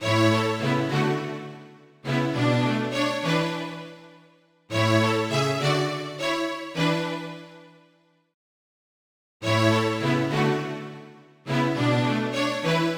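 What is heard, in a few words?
background music minigame; kingdom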